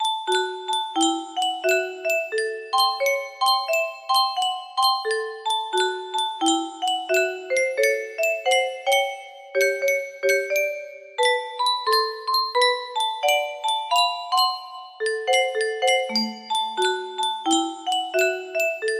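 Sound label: blues, music box melody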